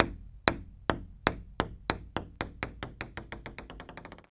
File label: PRC PP, Ping Pong; Rhythm Section; FX Perc